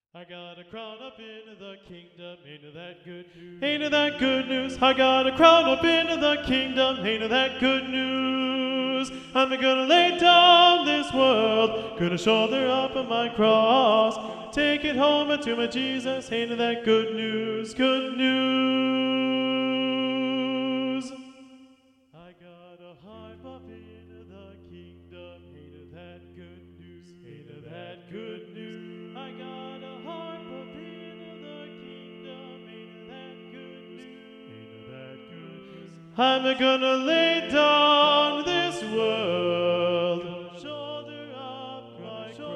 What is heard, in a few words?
tenor 2 predominant, TTBB